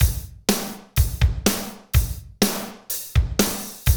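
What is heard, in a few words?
medium tekno, Roland drum beats; Northstar - Drumscapes Roland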